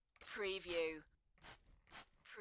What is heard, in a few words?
Spray Bottle; beanstalk audio